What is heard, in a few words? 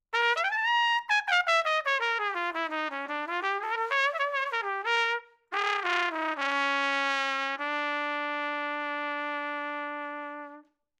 Trumpet Blues Lick